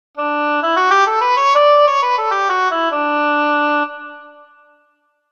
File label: oboe; kontakt player; sibelius